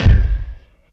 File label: voice bd, Yamaha TG-500